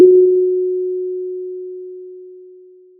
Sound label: Sound of a church bell